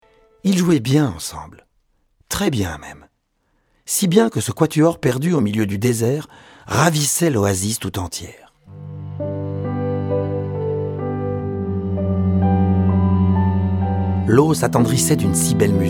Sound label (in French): le quatuor